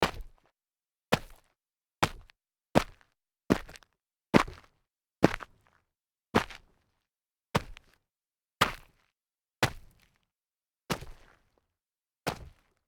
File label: sneaker medium jumps grit